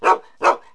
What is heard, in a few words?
DOG; animal fun